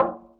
Barrel Hit Soft; Vintage Cartoon; Drums & Percussion

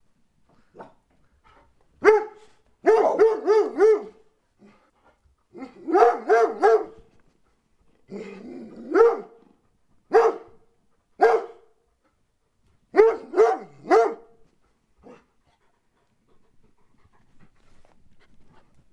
Dogs Large Barking

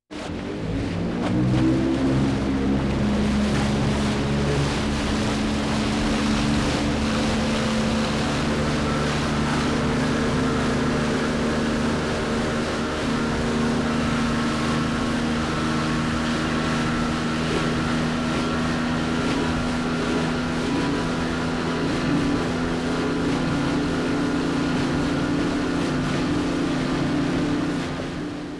Speed Boat

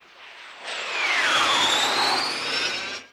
MIG Sweetener; MIG Sweetener 10

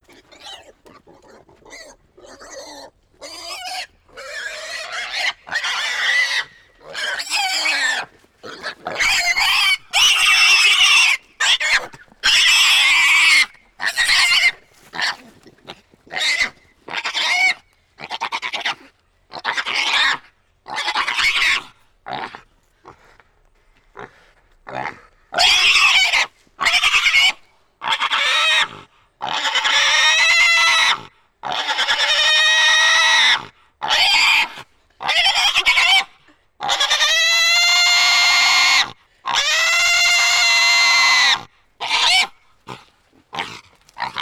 Pig Squeals Intense Short

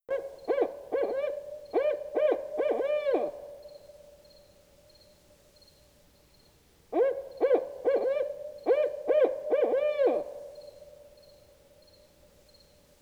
Owl Hoots With Crickets

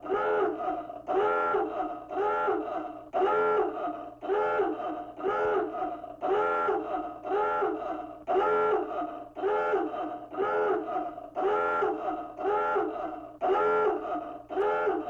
Submarine Dive Horn Classic; Vehicles; Watercraft

